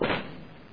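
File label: short dull thud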